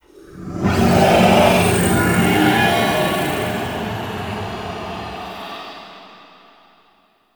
Eye Spawn; Warframe; Orokin Moon; Ambience

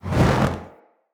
Player Torch Whoosh Fire; Weapons; Blunt

